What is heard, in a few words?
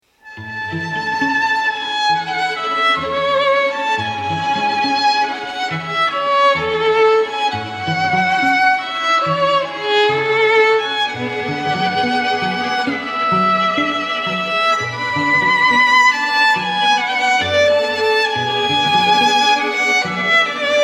Quartet, String Quartet; The Leos Janacek Quartet